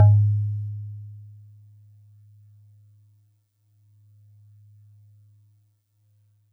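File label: Celeste DX100 G#1; Pristine Celeste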